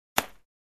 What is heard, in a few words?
Click in Room